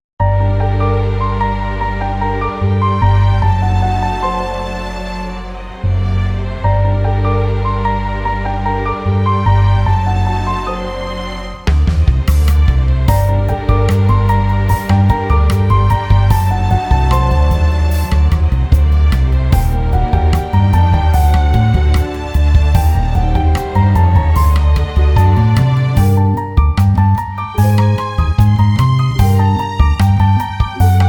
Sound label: Drizzle night